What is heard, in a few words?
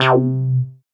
SWEEP BASS; Masterbits; Dance Trax